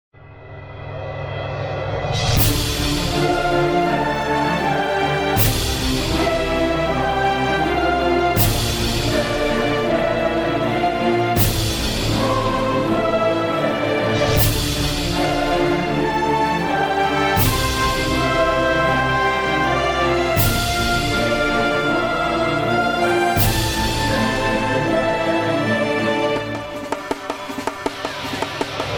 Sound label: Rhythm Guitar; Shockwave Sound; Fantasy Worlds Vol II+III